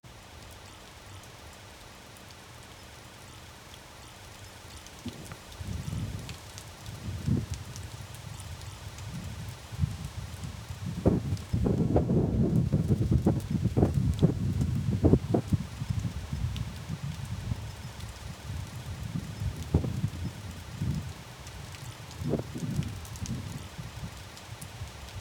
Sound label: light rain on the street; breeze